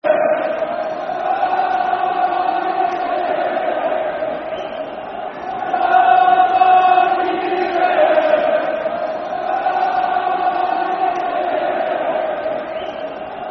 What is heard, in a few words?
Come On You Bairns [Football Chant (Soccer Songs)], Falkirk; fanchants